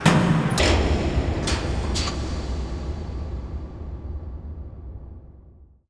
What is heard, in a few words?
sfx sci fi; Masterbits - Switch FX